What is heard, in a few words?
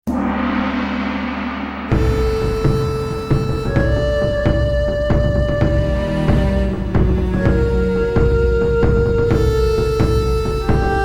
Egyptian Str Perc; Yu-Gi-Oh! Duel Monsters